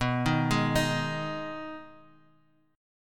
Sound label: B 6th B down, chord; arpeggiated